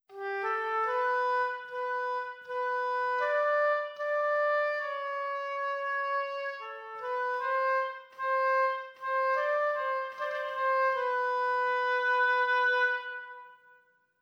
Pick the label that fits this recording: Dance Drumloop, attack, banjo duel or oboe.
oboe